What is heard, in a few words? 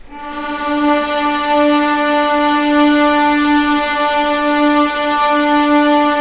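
D2 legato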